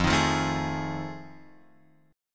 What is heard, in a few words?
F 7th Sharp 9th, Guitar, Open F; F7#9 strummed